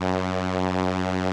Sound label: SOLINA, String Machines